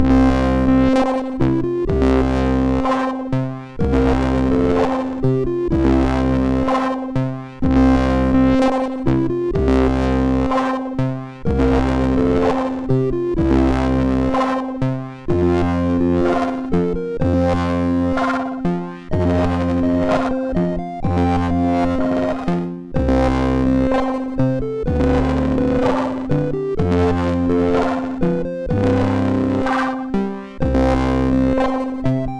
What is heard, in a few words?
MOS6581